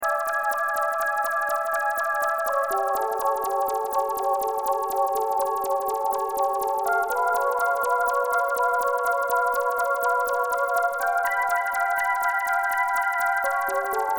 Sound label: Arpy